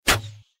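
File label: attack bow and arrow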